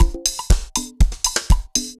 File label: dancefloor perc 120 bpm